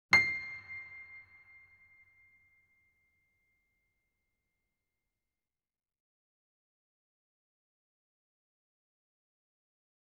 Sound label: C7 E7 A7 Grand Piano & Effects; Sound Sampling Collection VOL-3; Grand Piano 88